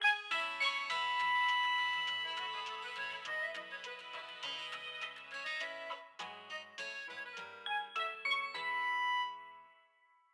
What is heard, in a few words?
Radio Jingle (Morning)